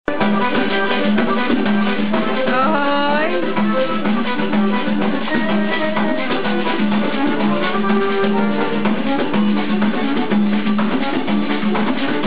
acordeon

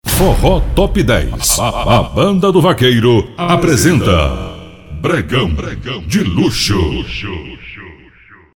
vinheta

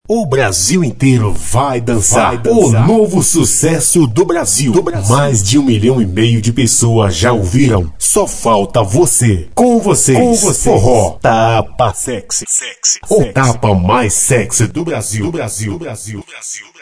forro ta pa sexy vinheta de abertura

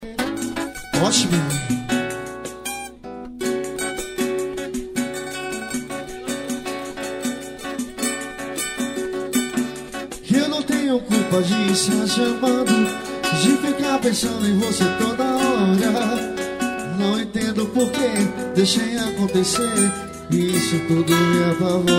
samba de garagem ao vivo

